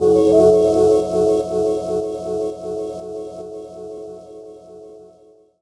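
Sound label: Pad Synth; Equipped Music; Loungin House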